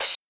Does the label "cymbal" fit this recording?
Yes